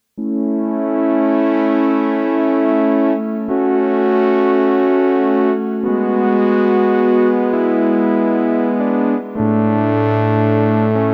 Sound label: Opus 3 Brass